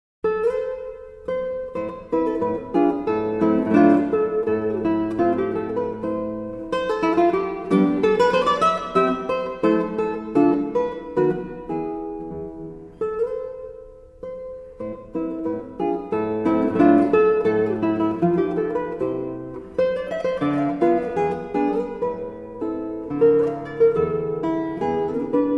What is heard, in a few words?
Gavotte, Guitar